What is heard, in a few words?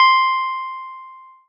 musical ding; locksounds